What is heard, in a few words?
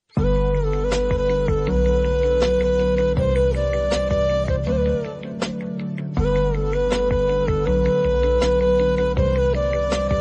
best old instrumental ringtone old is gold